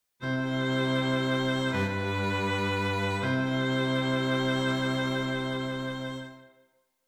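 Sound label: string quartet, dictation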